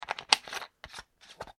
bottle plastic lid screw; Plastic Bottle Lid Screwing; Materials; foley; McKinney Sound

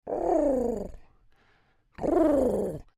Creature Oodle Bird Calls Suspicious; Animals

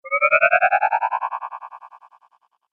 Magic Power Up; Magic Sound Effects

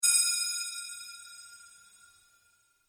Created pulse; Various sweeps, pulses and drones; Rises and Dives; Sound Design

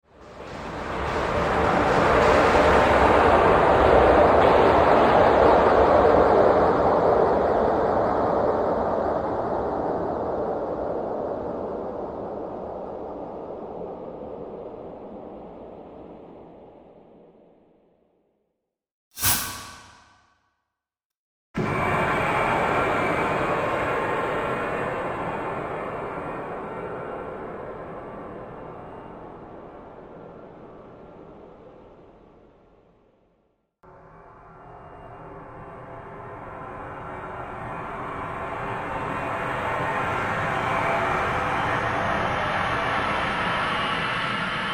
Whoosh Pack 10 Whooshes

